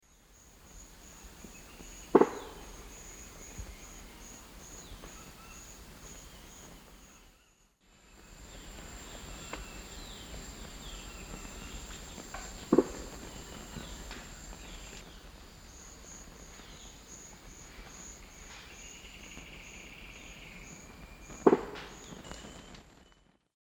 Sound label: Campephilus rubricollis, drum; S. America; Eastern Lowlands